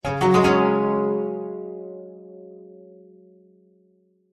multimedia game sound japanese plucked positive tone musical